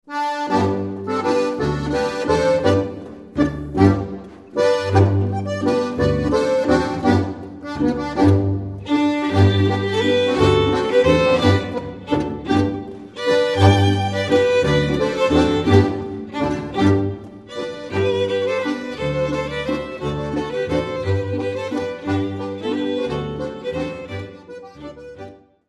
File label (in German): boarischer